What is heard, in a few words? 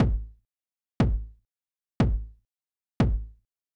TR909 Kick; hydrogen